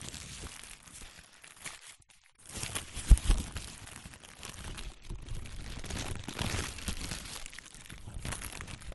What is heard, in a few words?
candy bar wrapper